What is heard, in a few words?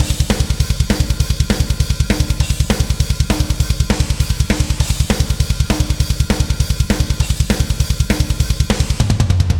Drums and Jingles Evil Drums; Metal Tales Fury of the Guitar Gods